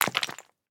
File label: stone fail